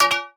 Physics Object Weight Impact

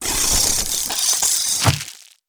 Fly Die; Mars Sortie

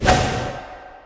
Mantis Horizontal Dash

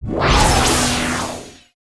New Ice Cast

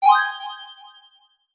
Basketball; Sfx Basketball

